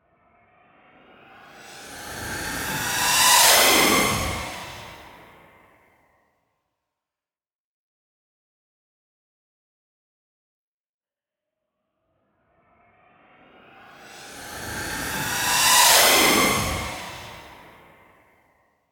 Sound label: Loud Jet Ish Fly By